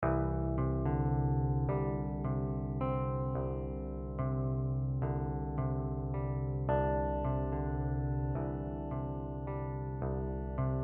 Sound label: Normal Scroll; I. M. Meen